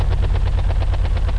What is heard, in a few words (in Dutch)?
de persen rollen! loop